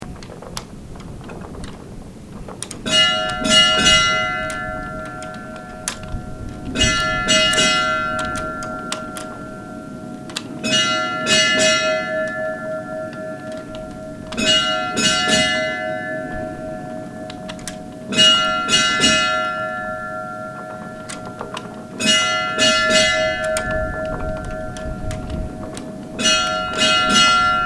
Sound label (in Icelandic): stadarholskirkja badar, kirkjuklukkur